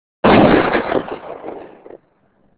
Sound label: rifle; Netherlands